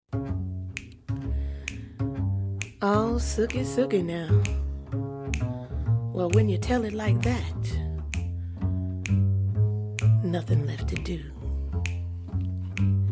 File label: Big Band